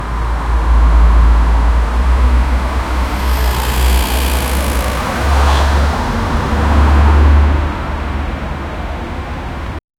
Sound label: city traffic ambience